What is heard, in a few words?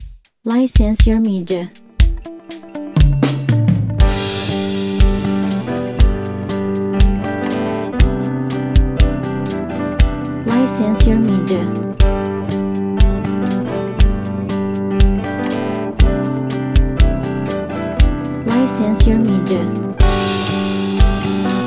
Bossa